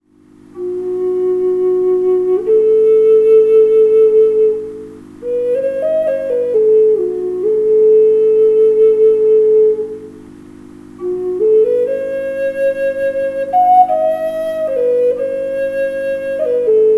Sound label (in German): Fis Flöte Klangprobe